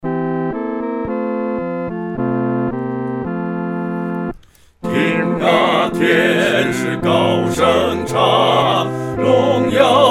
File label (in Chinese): 合唱（四声部）; 听啊，天使高声唱